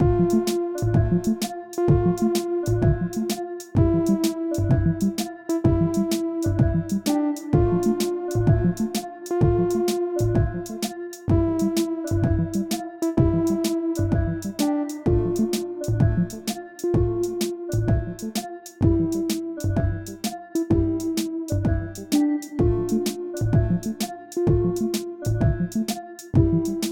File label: Dungeon Type Rap Beat, Dark Hiphop Beat; Genx Beats Experimental Vol. 2